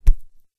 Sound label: Smack; Lewd SFX